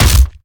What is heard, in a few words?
Collisions Melee Impact; Lurker; Evolve; Gorgon